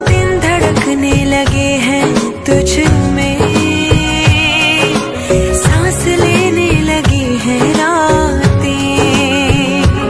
Ringtone

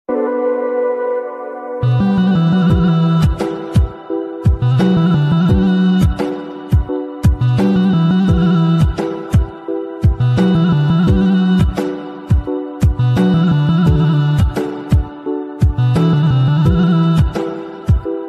Famous Turkish Instrumental Ringtone

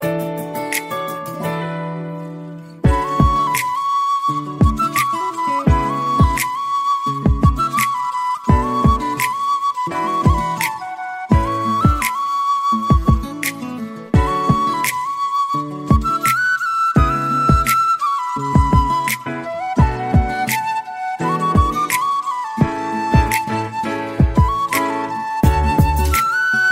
Romantic Flute Ringtone

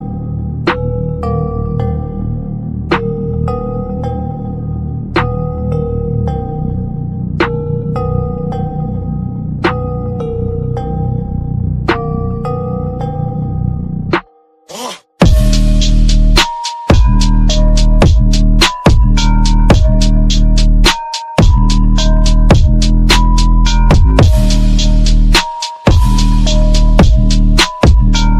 BGM Ringtone

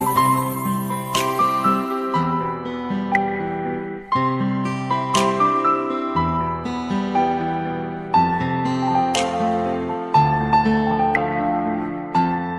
Instrumental Ringtone

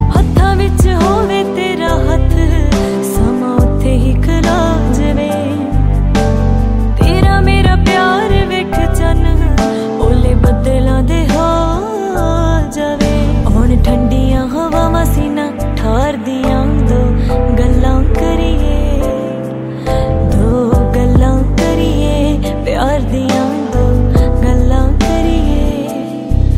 Female Verison

